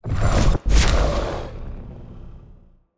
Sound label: Demon Minion Teleport; TANK; 3Souls